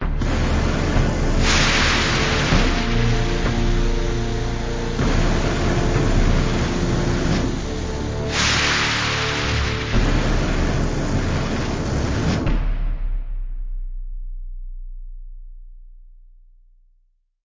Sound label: Motivational - Hover Pack Takeoff